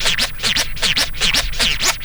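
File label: scratch, record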